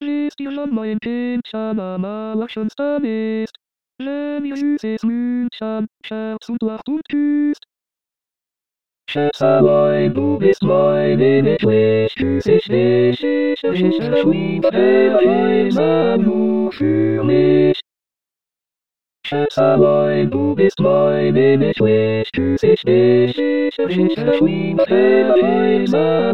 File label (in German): Zigeunerlieder tutti